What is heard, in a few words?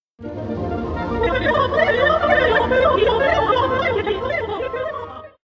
opera; mozart